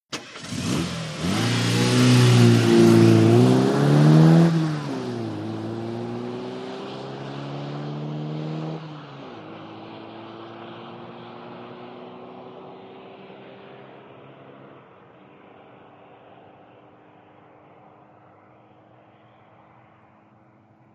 VEHICLES ASTON MONTEGO: EXT: Start, depart briskly.